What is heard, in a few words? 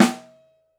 Snare Mono